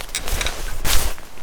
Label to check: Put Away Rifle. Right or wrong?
Right